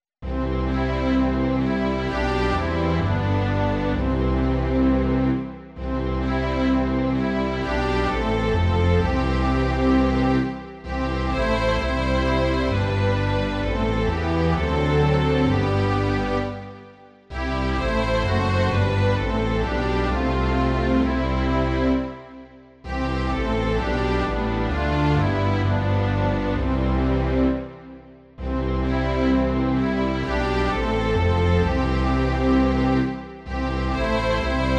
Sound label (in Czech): smyčcový orchestr; Velký Bože, Stvořiteli; Imaginativní verze